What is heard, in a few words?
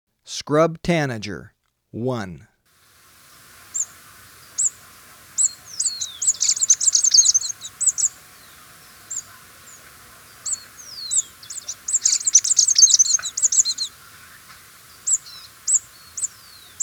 Scrub Tanager, Birds of Ecuador; S. America